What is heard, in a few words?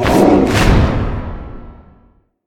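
power activate; smite; thanatos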